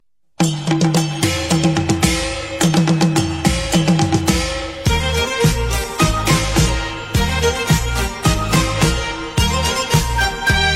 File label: chinese new year song